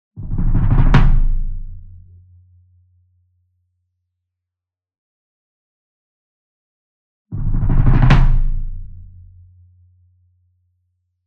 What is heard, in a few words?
Bass Drum Marching Roll Soft Beater Near; SINGLE DRUM; boom library; Cinematic Strikes Construction Kit